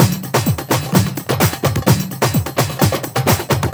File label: Latin House Loop 128 BPM; Vengeance Samples